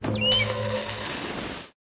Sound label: Hawk; Pet; Die; yastreb